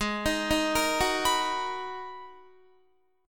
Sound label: Ab Minor 9th Ab, Guitar; arpeggiated; JGuitar